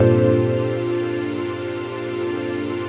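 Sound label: trance pad; Best Service; XX Large - Pads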